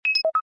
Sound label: computer